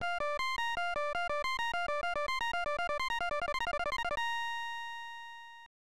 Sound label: Treasure Chest Appears; Yuujin no Furi Furi Girls; snes